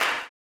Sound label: CLAP, blues & soul; ILIO; Vocal Planet